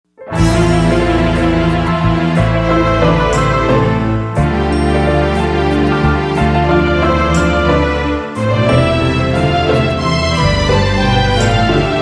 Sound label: Violin